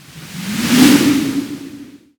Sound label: Ice Spell Mobility Teleportation Frost Blink; Mobility & Teleportations; Arctic Aura